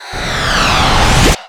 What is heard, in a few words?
Enemy Roadmechanic Wall Drain